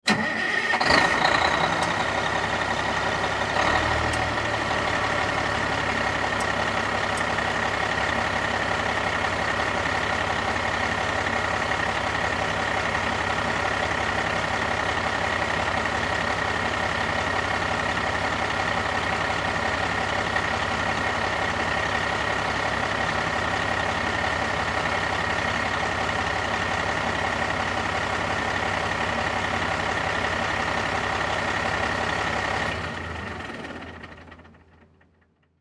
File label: Fire Truck Exterior, Fire Truck Exterior Start Idle Turn Off; Emergency Vehicle